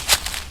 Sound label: forest; terrain; draugnorak